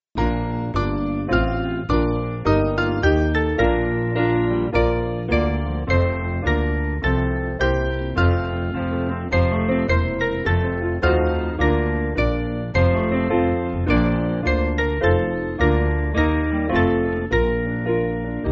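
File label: Piano, Free Music Backing Files for Churches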